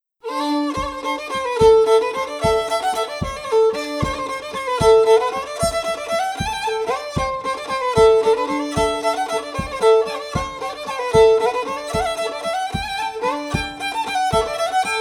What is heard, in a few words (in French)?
bourrée 2 tons; Mouret